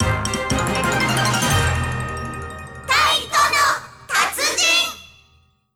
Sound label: Jingle Opening